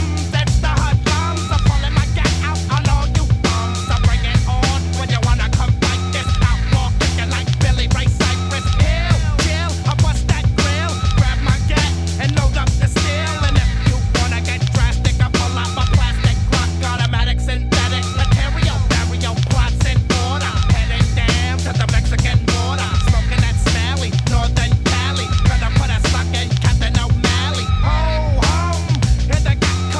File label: hiphop